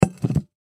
Pot Set Down; Pot Stainless Steel 3 Quarts; Wood Surface; Household; Pots and Pans

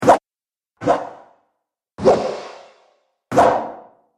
Axe Swooshes Dry And Reverb; Battle Sound Effects

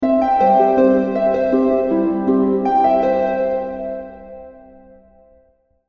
Harp Transition 80Bpm; Musical Sound Effects

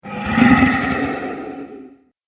Massive Dragon, monster

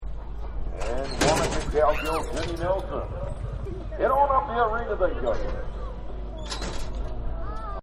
Rodeo Starting Gate; Backgrounds

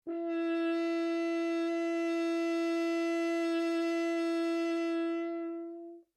HORN E5 FF LONG STOPPED, French Horn in F; Alexander French Horn in F; Sound Effects:Musical; Foundation3

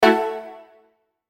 Hit Electric, Hit Keys Reverb; Electrical Button; Blastwave

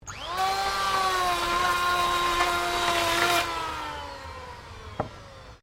POWER TOOL ELECTRIC PLAIN WOOD; Various power tools

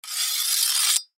Machete Scrape Metal; Warfare; Knives (Sound Effects:Combat); Blastwave

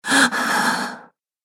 Woman Gasps In Fear; Gasping Sound Effects

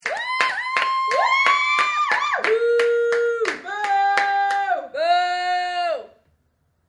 Female protest crowd; Chanting; Voice

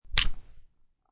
Cat Scratches Microphone, Cat scratch sound; Animals

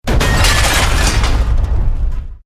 EXPLOSION MEDIUM METAL GLASS

